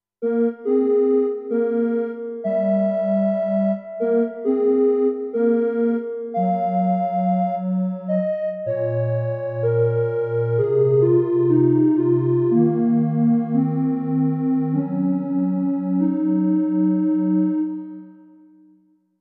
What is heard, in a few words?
Square